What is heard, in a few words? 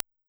Focusrite ISA, Reverb; FL Studio 9; Fruity; Edison